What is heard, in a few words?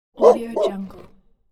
dog bark; audio-tree